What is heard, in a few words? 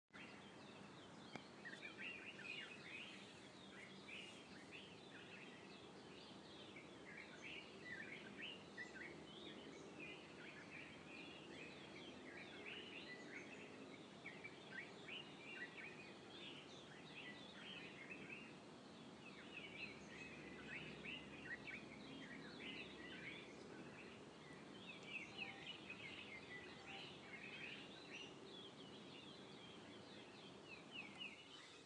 dawn chorus in garden; ashtordiffe's garden; soundsofdreams; blindtravel; austinnix123